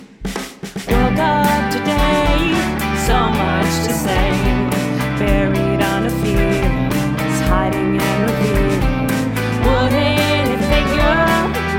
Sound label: Britpop; musdb18; Music Delta